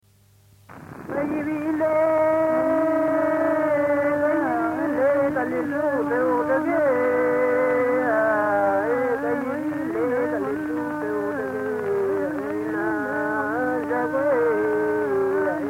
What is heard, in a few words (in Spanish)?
Coro de mujeres; Quilay Quina, Neuquén, Argentina; Música Mapuche